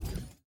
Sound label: Hand Paddle Release Extra Light